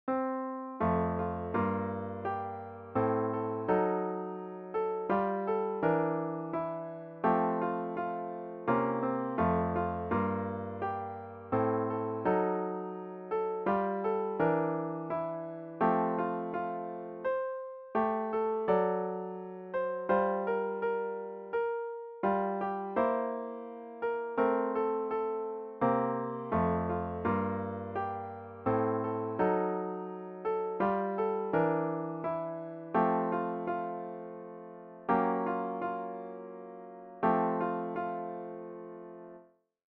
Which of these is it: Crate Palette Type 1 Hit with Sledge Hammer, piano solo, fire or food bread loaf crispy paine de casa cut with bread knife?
piano solo